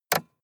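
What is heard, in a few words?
sports car interior switch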